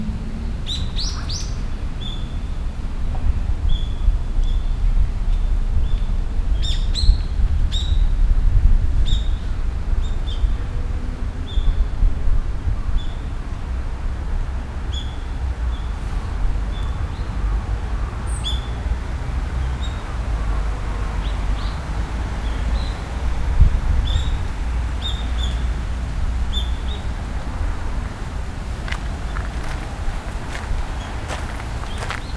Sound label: bird; ZOOM F2